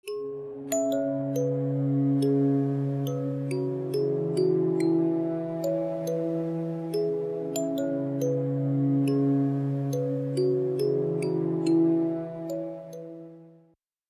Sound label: a tranquil music box